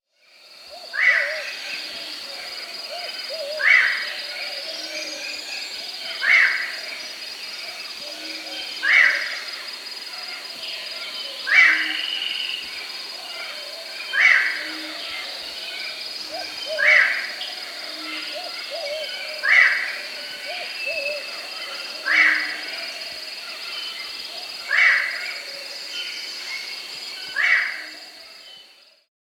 Hooded Tinamou (Nothocercus Nigrocapillus), Andean Birds; S. America